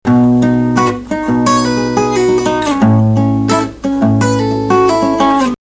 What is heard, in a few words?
Loops - Guitar Chords; Scratch